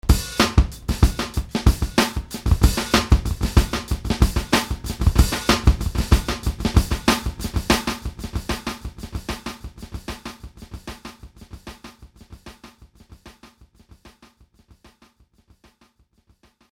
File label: echo